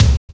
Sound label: kick, Drum and Bass